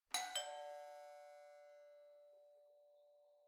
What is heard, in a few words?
Doorbell